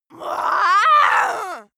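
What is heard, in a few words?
female angry, screams; schreie; menschen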